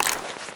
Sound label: auto shotgun load shell